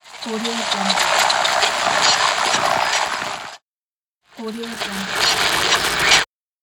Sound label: radio interference